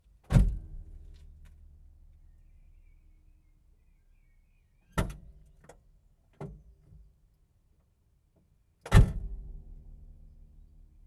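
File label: Saab 99 foley door open close interior onboard engine right; SAAB 99 GL 1976; Pole Position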